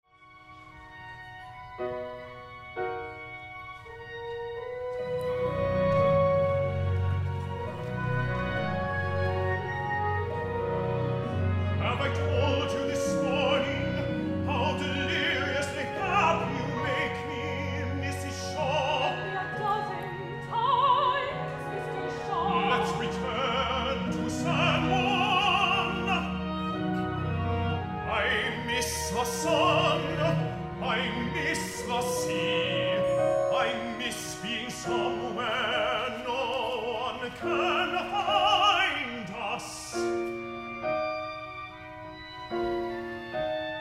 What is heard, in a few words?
Duet, opera